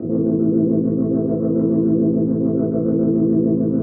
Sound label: DEEPHOUSE ORGAN 125 A